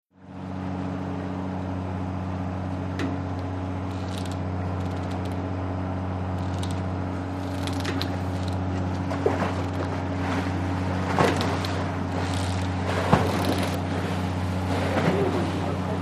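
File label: Ferry Idle Engine, ferry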